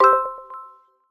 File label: chip minor